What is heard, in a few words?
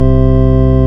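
ORG Vox, Continental organ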